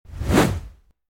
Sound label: swoosh